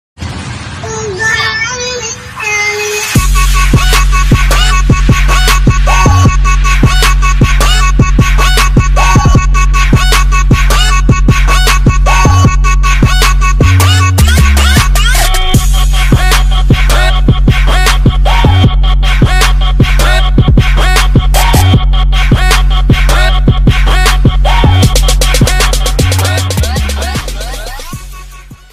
Bike Lovers BGM ringtone

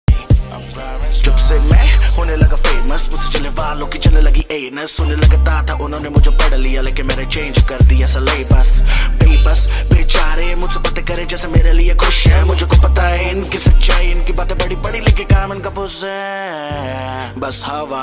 rap hindi ringtone

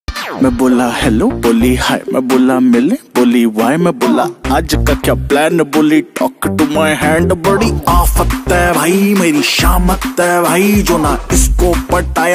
hindi rap ringtone

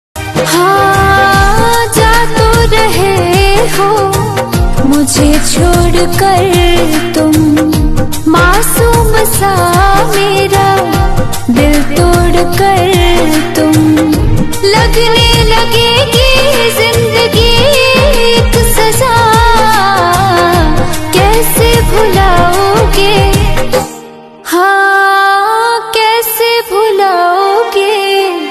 Bhojpuri ringtone

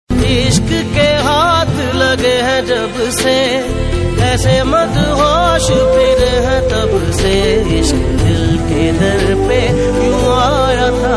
Ost pakistani ringtone; Sadqay Tumhare

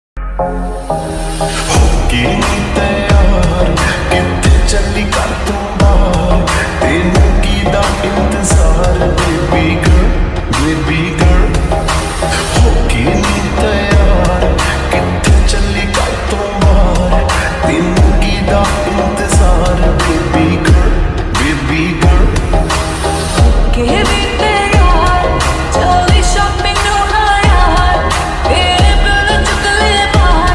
slowed lofi punjabi ringtone

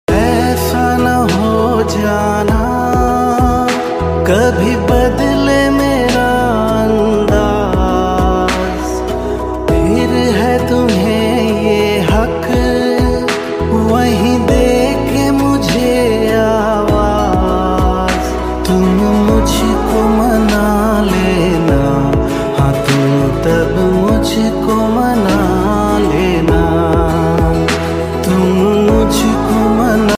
male version hindi ringtone

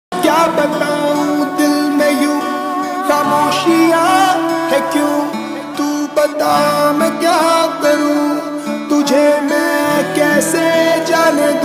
hindi rap ringtone

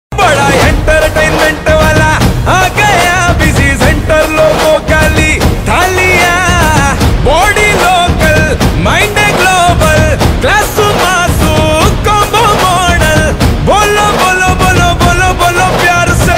telugu ringtone

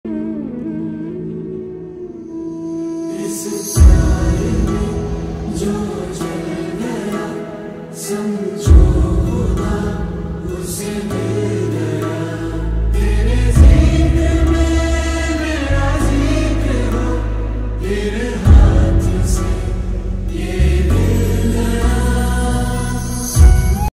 pakistani ringtone

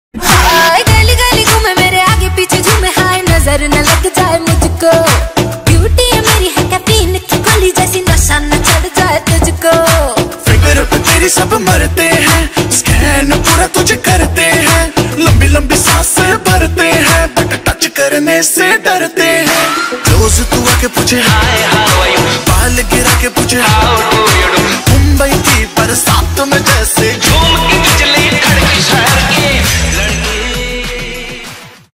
rap ringtone

